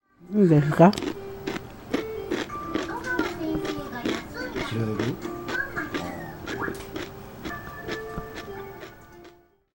serori wo taberu; セロリを食べる音

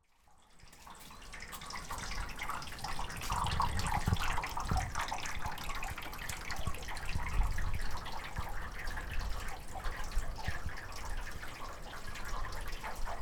yukidoke; 雪どけ

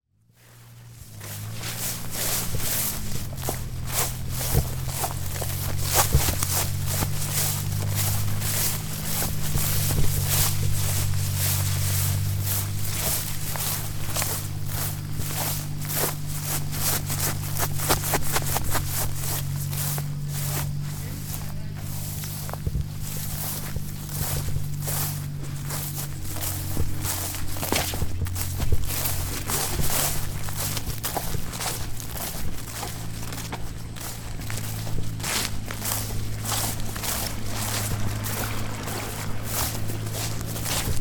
kusamura wo aruku; Chino Cultural Complex

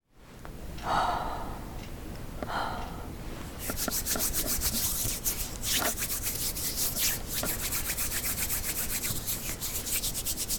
te wo atatamemasu; 手を温めます; きれいなはなこ

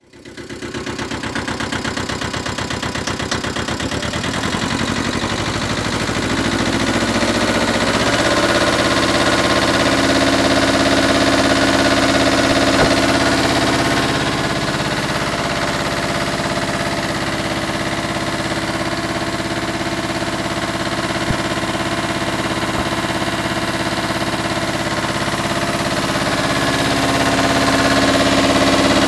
yuki kakisha; 雪掻き車の力強い除雪作業中の音; Chino Cultural Complex; 茅野市民館

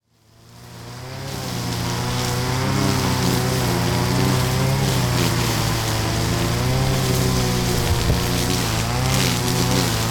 ki wo kitte iru; Chino Cultural Complex

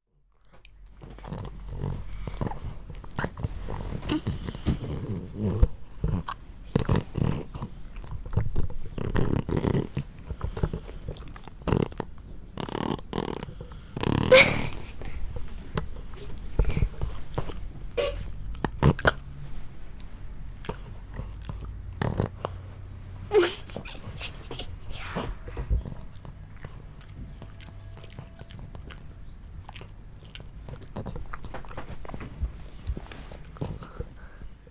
wanchan ga gohan wo taberu; Chino Cultural Complex

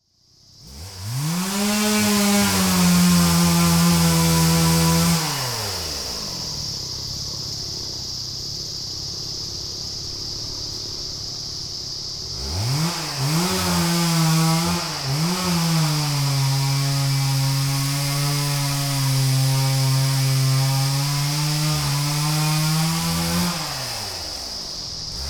mori no naka ki wo kiru; Chino Cultural Complex

